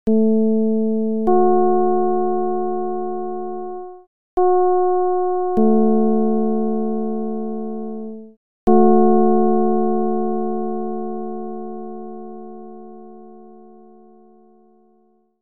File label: csound foscil 220hz